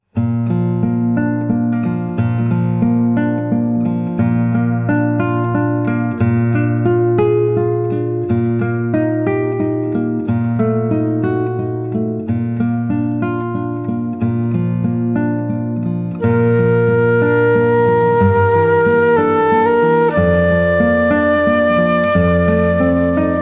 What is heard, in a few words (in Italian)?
Ave Maria di Schubert eseguita dal duo; Fiato alle Corde